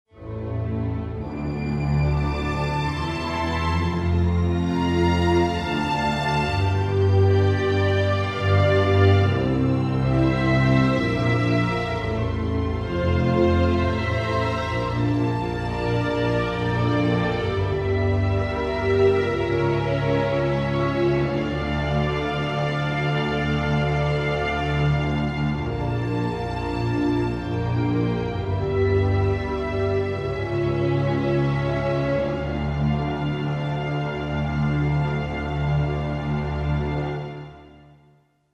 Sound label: Stones Strings; Stone Circle; Music Documentaries